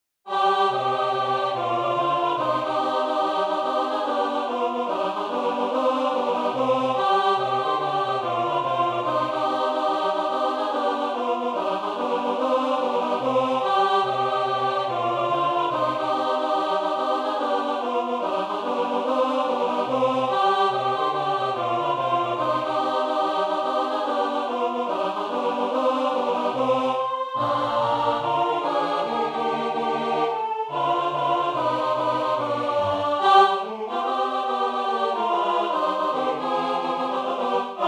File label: Tut, Swing; Bourree